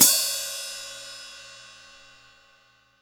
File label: Hat open suck; Akai MPC500; Hats TKit